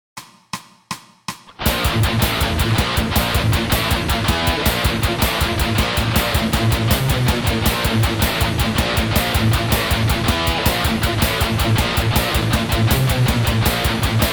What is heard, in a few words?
backing track